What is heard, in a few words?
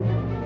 Dramatic Start 64bpm; Music Dialogue